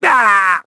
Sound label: HIT; BattleSNDS